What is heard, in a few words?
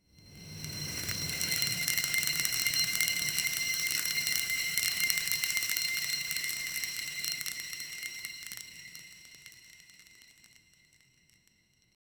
slow motion molten gold d, velvet